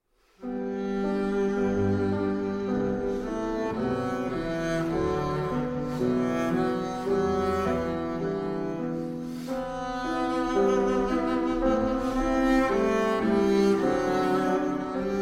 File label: Double Bass & Piano; Pavane Pour une Infante Défunte, M. 19